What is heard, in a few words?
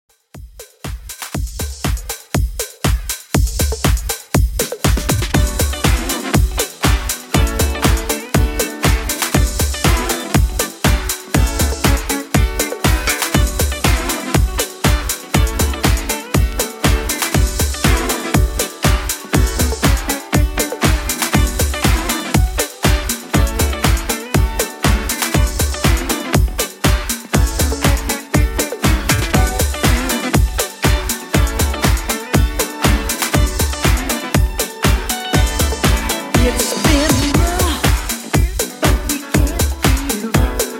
Disco Mix 120Bpm